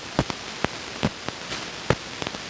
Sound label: 5 MHz 50 MHz stereo, noise